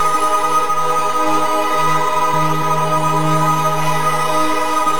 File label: base loop; sona; paxsona